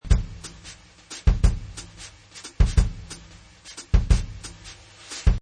Loop Jazz Brush Beat 180, Jazz drum brushes beat loop at 180 bpm.; Drum Loops